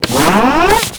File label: Clan Dojo Elevator Door Open; Doors